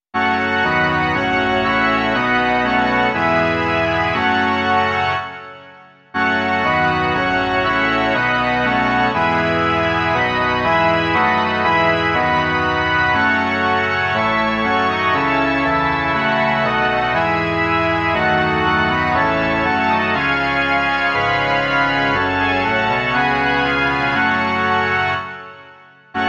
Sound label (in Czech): varhany; Varhanní verze; Bůh náš všemohoucí